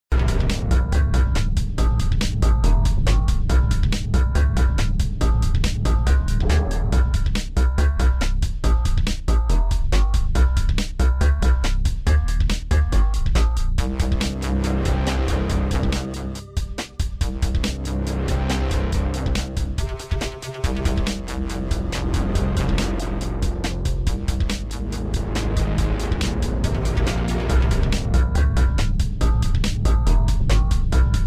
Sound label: heavy industry, soundtrack; motherload